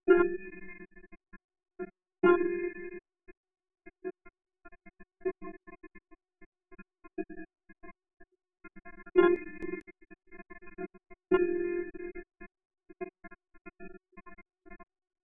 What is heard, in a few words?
piano 2 layers